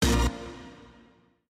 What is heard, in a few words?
phone; n8 phone FX